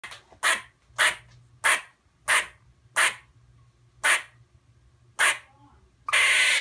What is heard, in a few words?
Squirrel barking